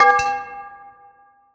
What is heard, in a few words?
Bowl Hitting Ground; Feed The Beast